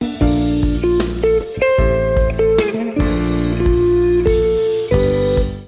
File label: horn theme